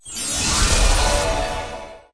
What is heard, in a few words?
random Symbol Transform; fairyhansel